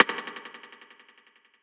snare oneshot; SD PASADENA Pop Sample Pack; Slate

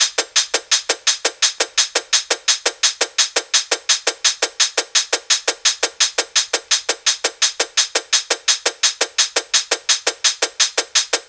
hat loop 85, Drums; Pop; Hat Loops; wabash; Slate; SD PASADENA Pop Sample Pack

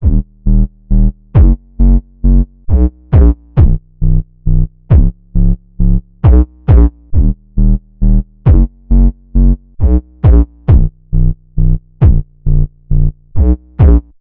Synth loop 135 Ebm, Synth Bass Loops; Slate Digital BASSHOLE Sample Pack

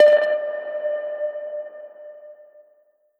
Perc FX Sonar, 909; Slate; Vintage Drum Machines Volume 3 909 Edition

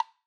woodblock Single hit; Slate; SD SEISMIC Drum Sample Pack

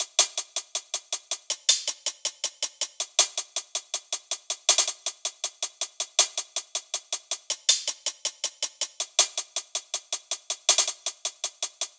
hat loop 80, Drums; Pop; Hat Loops; Slate; SD PASADENA Pop Sample Pack

